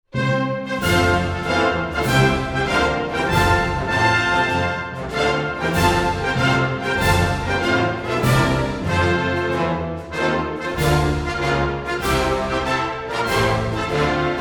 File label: mozambique, national anthem